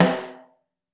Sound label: SN2 Mono, Snare; Ableton Foundation; Snare Designer